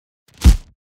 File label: SMACK